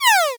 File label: Laser Shot